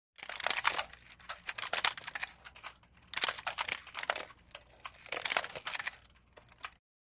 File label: Group Cameras Simult, clicks